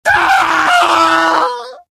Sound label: pain crticial death; demoman; source engine; tf